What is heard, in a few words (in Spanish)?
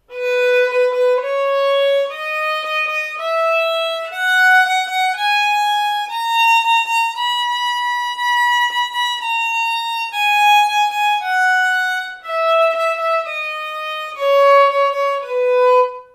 si ritmos; mi primer libro de escalas